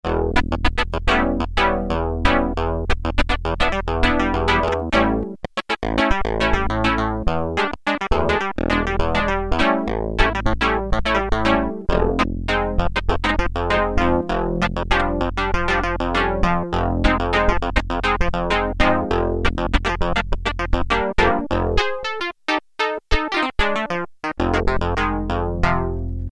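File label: Clavinet, Roland Juno-106; Factory Preset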